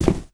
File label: step; mine2d